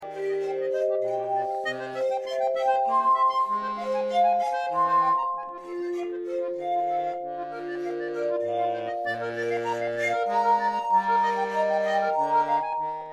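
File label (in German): Flöten Sonate; CPE Bach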